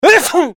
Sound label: sneezing